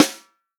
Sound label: snare drum, POP SNARE CHROMATIC; Best Service ProSamples vol.43 - Real Drum Kits